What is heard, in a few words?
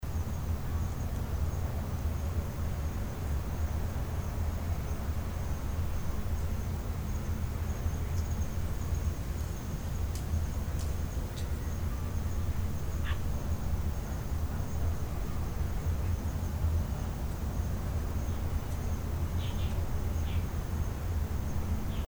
Tascam DR-680 Phantom Power Preamp Noise